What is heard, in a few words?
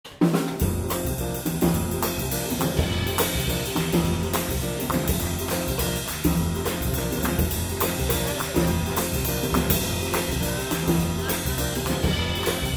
Sticks